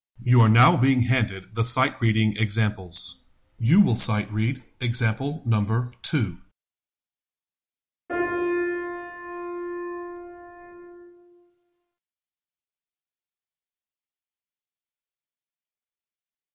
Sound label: Sightreading Example Soprano